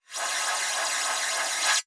Transition Reverse Warble Reverse; Production Element

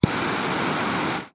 Industry Blow Torch

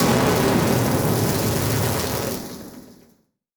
Title Transition Burst; Production Element Title Transition

